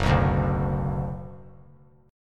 Gb Suspended 4th Sharp 5th Gb, down; strummed; JGuitar

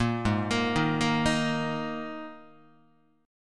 G sharp Suspended 2nd Guitar chord, down; G#sus2; arpeggiated; JGuitar

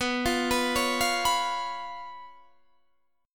B Suspended 2nd Flat 5th, Guitar, Standard; Bsus2b5 arpeggiated; 19,20,21,18,18,19; JGuitar

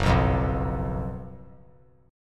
Gb Major 7th Suspended 4th Gb; GbM7sus4; strummed; JGuitar

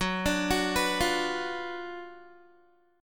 Gb Major 7th Suspended 4th Sharp 5th, Guitar; GbM7sus4#5 arpeggiated; 14,17,16,16,17,x; 4E,4A,5D,5G,5C,6F

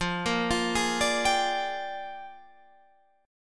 Bb 13th F

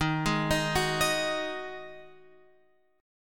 D sharp Minor Double Flat 5th

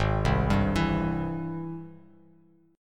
Ab Augmented Major 7th Ab Bass Standard; arpeggiated; Ab+M7; JGuitar

